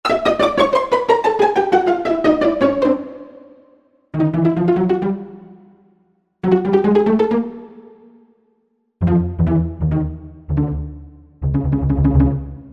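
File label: Pizzicato Strings; Sonic Reality Modern Songwriter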